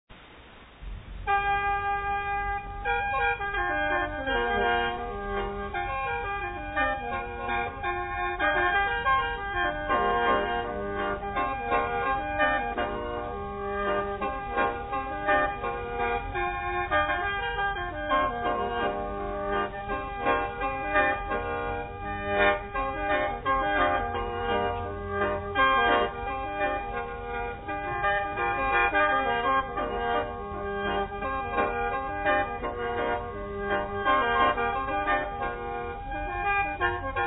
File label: Khaen song, Lao Khaen Music